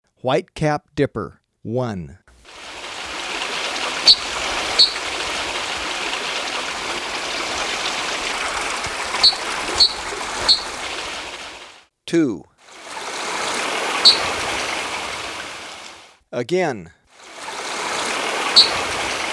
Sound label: White-capped Dipper, Birds of Ecuador; S. America